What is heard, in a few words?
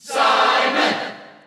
Cheer Dutch; SSBU